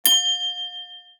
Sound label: microwave